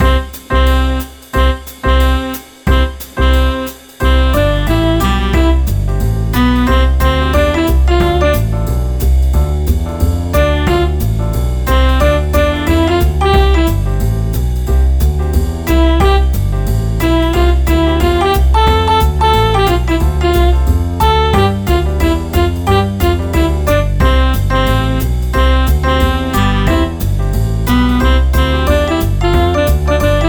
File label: Classical; nintendo 3ds home menu themes; Rascal the Raccoon